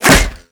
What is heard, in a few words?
bullet impact crate; weapons; Modern Warfare